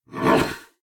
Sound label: Combat; Eshimal; SyrinScape; Snarl and Oraculum